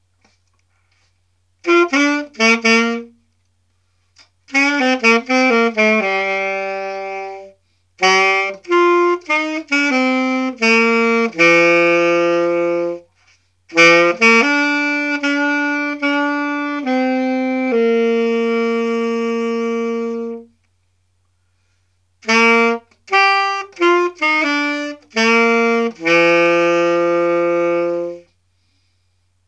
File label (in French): coucou papa saxo